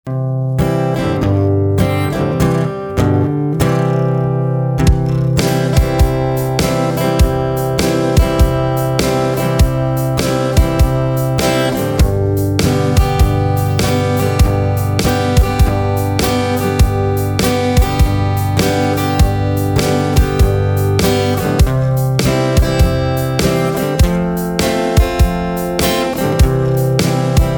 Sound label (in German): ohne Floete; floetenschule